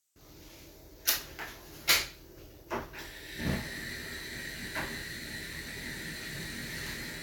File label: Lighting the stove